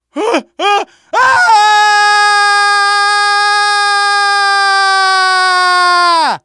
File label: fall, survivor voice